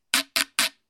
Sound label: acoustic shaker plastic three times; percussion